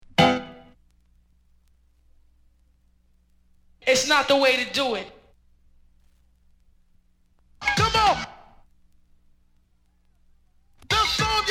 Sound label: samples scratches